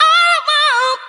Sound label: kid, SUNG